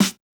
Snare Hit; Hip Hop; 090 Cmin; Hip Hop Exotica; Apple Loops; Big Fish Audio

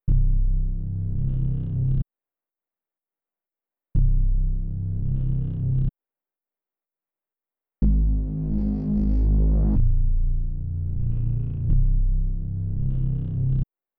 124 BPM C Min Bass; Cymatics; Rari; Destiny Gold Expansion

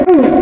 Monkey, Animals Wavetables; Cymatics; Serum; Animals for Serum - Gold Edition

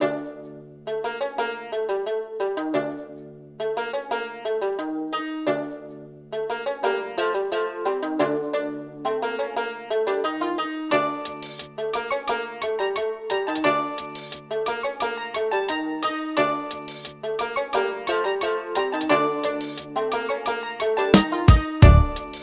088 Dmin, Hip Hop Exotica; Apple Loops; Big Fish Audio

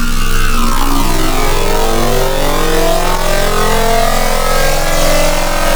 Robotic Voices, Wavetables; Cymatics; Essentials Wavetables; Serum

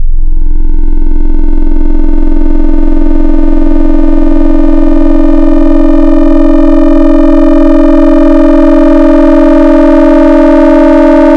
Sineday, FM Wavetables; Cymatics; FM Wavetables Vol 4; Serum

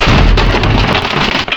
Realm, Foley Wavetables; Cymatics; Foley Wavetables Vol 1; Serum

